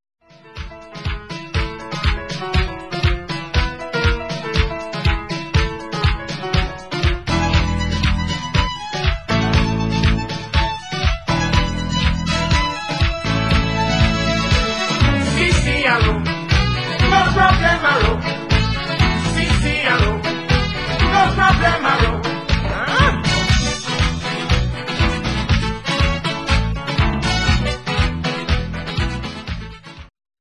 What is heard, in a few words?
merengue